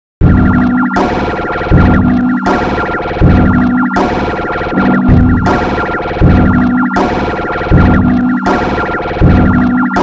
Sine Wave Gone Wrong

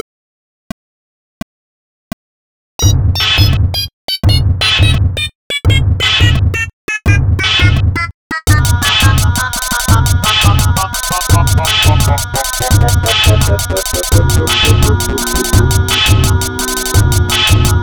SINE WAVE PROJECT

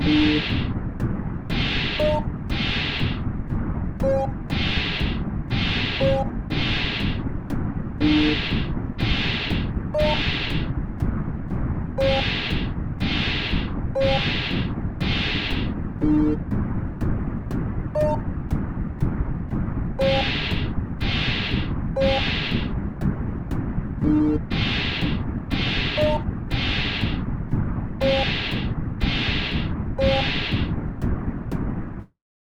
Sinewave Project

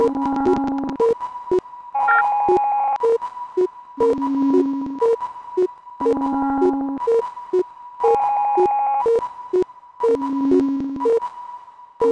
Sine Wave

